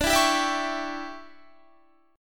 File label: D Major 7th Suspended 2nd Suspended 4th D down Guitar 4F 4A 5C 5G 5C 6E; DM7sus2sus4 strummed